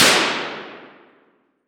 Weapon Gun Rifle M1 Carbine Single Shot Interior Shooting Range; AdobeCC